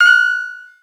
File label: Single UI Beep